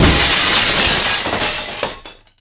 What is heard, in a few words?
smash big window; hits; Tony Hawk's Project 8; XBOX